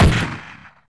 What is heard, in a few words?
Dual Machine Gun; BaboViolent 2